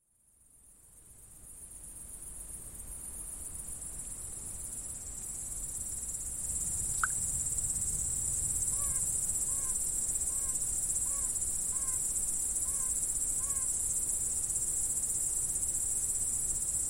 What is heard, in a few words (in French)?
sonneur